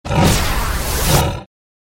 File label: Tiger